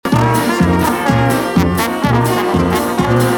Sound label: fanfar